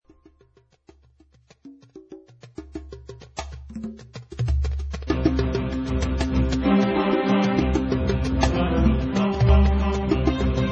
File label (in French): huit string